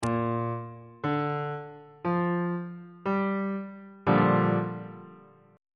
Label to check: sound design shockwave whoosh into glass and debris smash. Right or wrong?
Wrong